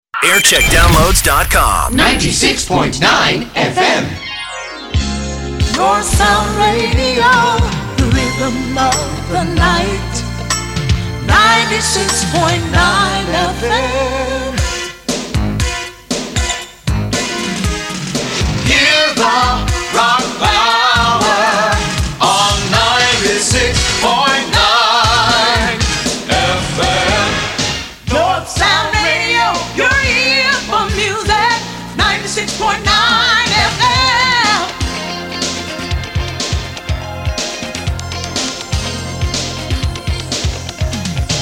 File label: jingles; northsound